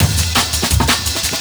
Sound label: kickback amen